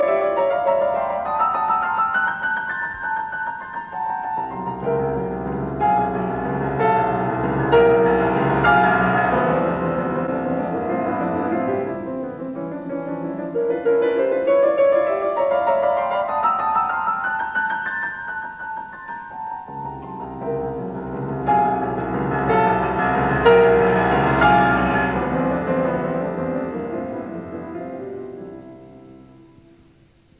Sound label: Variations in C major on a Waltz; 33 Variations; Op 120